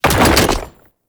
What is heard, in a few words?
Archgun React; Warframe; Weapons; Archwing; Tn Concrete Archgun